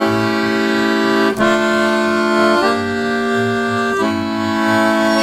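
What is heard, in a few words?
Balkanish Traditions accordion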